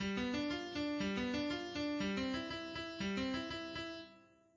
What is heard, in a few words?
triplet + 8; orpheus